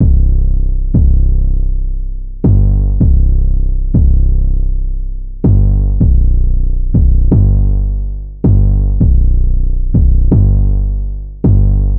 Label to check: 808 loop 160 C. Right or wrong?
Right